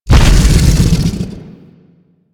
Biped Vocal Death; Warframe; Man In The Wall

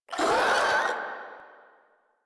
Infested Charger Pain, Enemies; Warframe

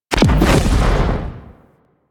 Rocket Explosion; Jelly Rocket; Warframe; DE; Vehicles; RailJack; RailJackEnemyShips; Grineer; Space Fighter Dredger Agent

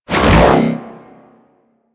Hoverboard Ground Spin; Warframe; Vehicles; Venus; anim sounds

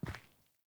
Grunt Boot Walk Default, Footsteps; Characters; Enemy; Melee Grunt; Boots; Sinecure

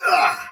Pain Soldier Sword Agent Male, Battle; Dialog; Barks; Melee; Sinecure

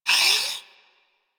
Leg Movement; Enemies; Warframe

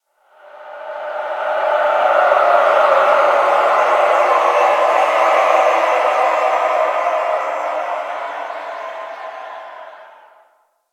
Screaming Space; Ambience; Exterior; Warframe; Railjack

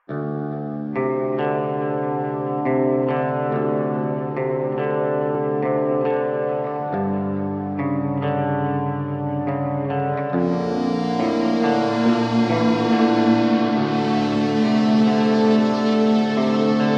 Hub Amb Cue, Music; Dynamic Music; 1999

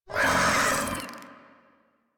Desert Skate Vocal Death A; Warframe

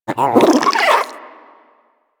vocal idle, critters; Warframe; Hunting Critters; Nexifera

